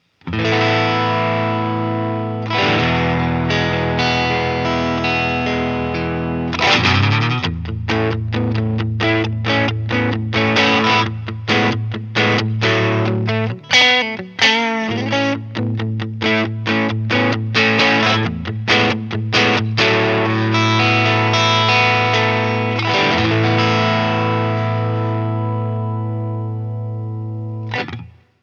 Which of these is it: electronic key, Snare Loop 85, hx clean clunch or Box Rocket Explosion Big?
hx clean clunch